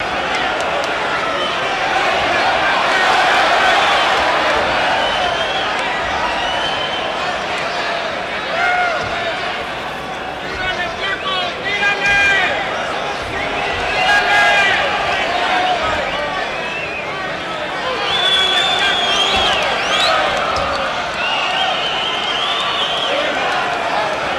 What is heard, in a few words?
Crowd Walla, boxing